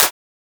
Clap, Percussion; Sample Banks

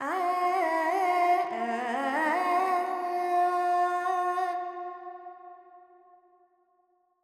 Verb Dorian, Vocals, Carnatic Female, Alaps, 70 G

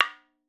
madalam notes R; Sample Banks; Percussion; MADHALAM

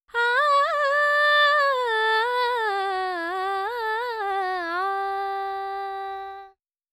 Dry 4-4 G 100, Vocals Male; Vocals Female

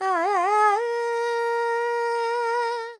Dorian, Vocals Carnatic Female Alaps 70 G Dry

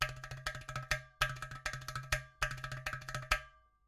Fills 100, Percussion, Ghatam; Sample Banks

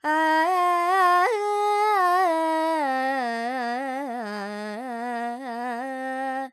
Pentatonic, Vocals, Carnatic Female, Alaps, Dry

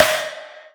Snare; Percussion

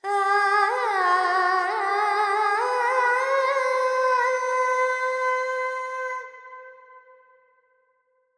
Carnatic Female Alaps, Panthuvarali, 100 G, Verb

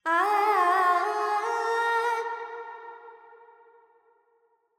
Pentatonic, Vocals Carnatic Female Alaps 70 G

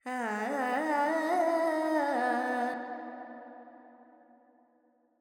Verb Phrygian, Vocals, Carnatic Female, Alaps; 70 G; Sample Banks

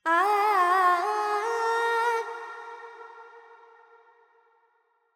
Pentatonic, Vocals, Carnatic Female, Alaps, G; 70 G; Sample Banks

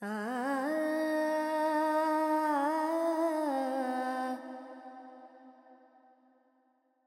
FX, Vocals, Carnatic Female, Alaps, Charukesi; Sample Banks

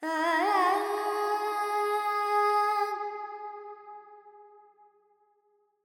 Verb Charukesi, Carnatic Female Alaps; Sample Banks

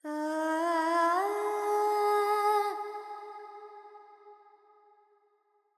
FX Phrygian, Carnatic Female Alaps; Vocals; 70 G; Sample Banks

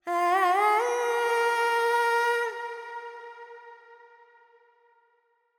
FX Dorian, Vocals, Carnatic Female, Alaps, 70 G

